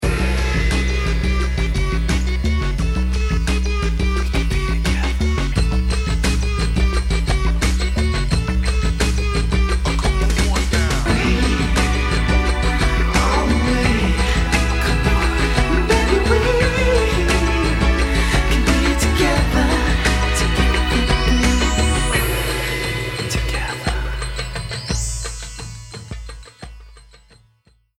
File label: trippy rb